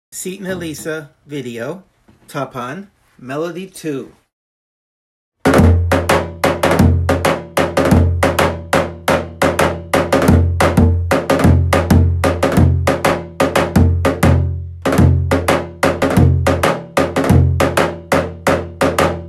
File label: tapan melody